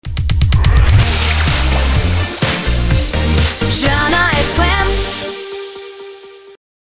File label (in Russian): Авторский джингл; Жана Фм